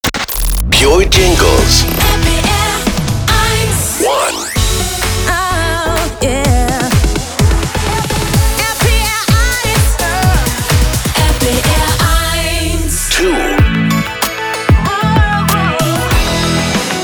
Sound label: Jingles Jingle Package; PURE; RPR1; Fall 2011